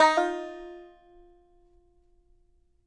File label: F 4, BANJO NOTES; Sampleheads; Whole Lotta Country 1 & 2